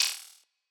Percs

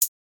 Hihat; bedroom baddies

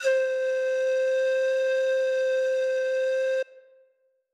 Flute; MPC expansion

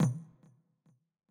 Percs

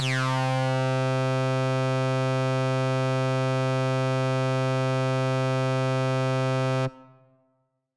Lead; mpc expansion

